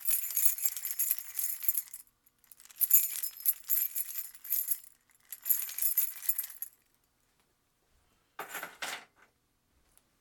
keys

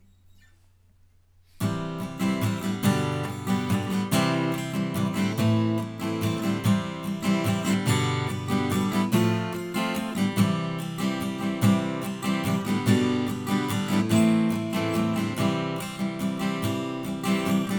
guit

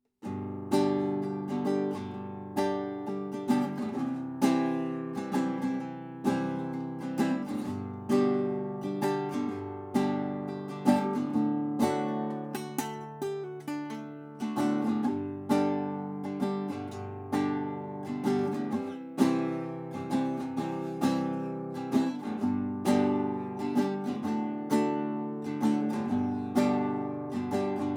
spaced classical

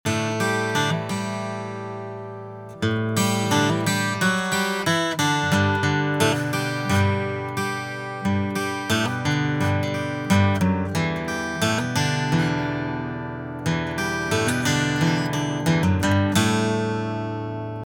12-String

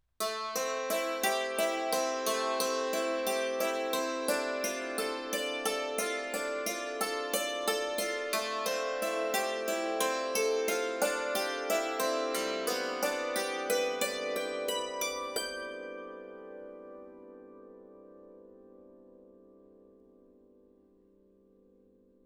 dulcimer

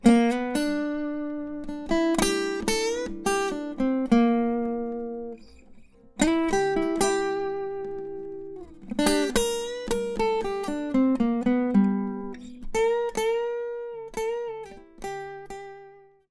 guitar tail

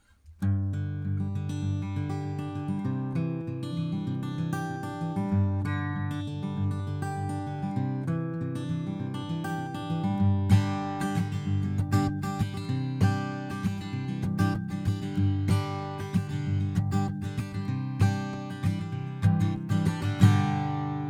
guit

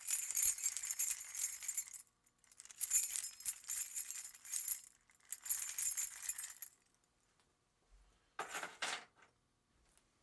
keys

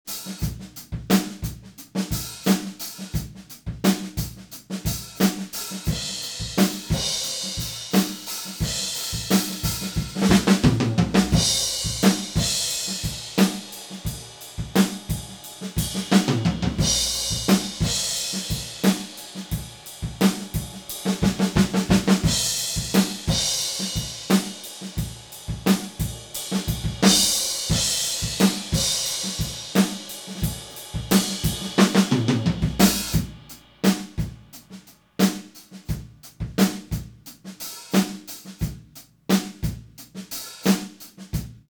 Beta 91A Room Mic

